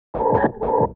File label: HOUSE FX 125